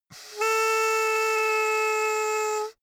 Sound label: hoot soft